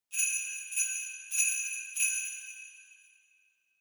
Sleigh Bells Shake Gentle Short

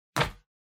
Book (Put Down)

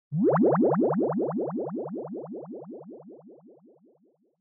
Anime Motion Low Delay

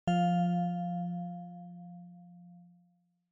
OS Sound Notification

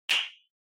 Finger Snap Foley, Dry

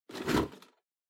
Drawing Box Hand Out

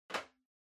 Portable MD Far Put

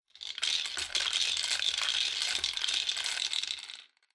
Drawing Machine Garapon Few Spin Long

